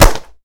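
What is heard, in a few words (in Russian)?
упавшая на пол деревянная скалка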